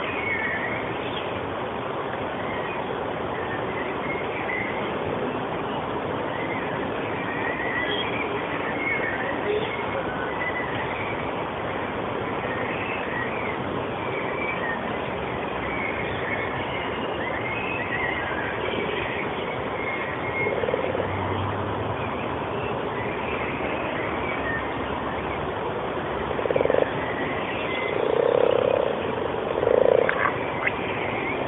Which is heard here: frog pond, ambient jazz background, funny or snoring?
frog pond